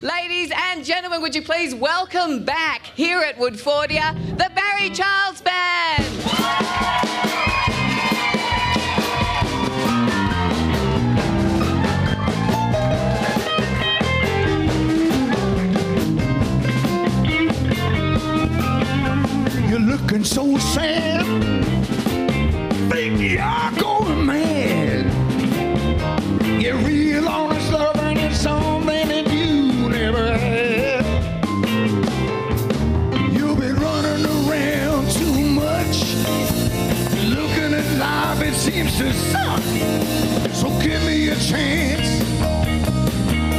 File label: 2nd song